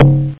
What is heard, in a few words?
bongo; Percussions; MAXON-FTM